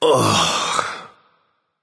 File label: "ugh"; smite; merlin